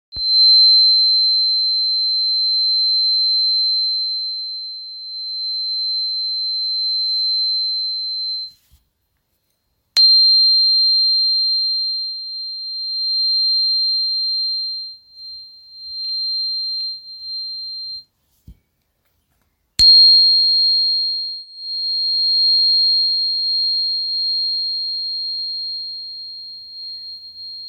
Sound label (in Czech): voice, terapeuticke anjelske ladicky hlinik